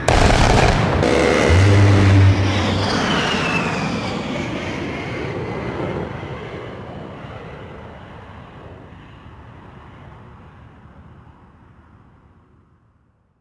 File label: ambience; svencoop; urbicide; warthog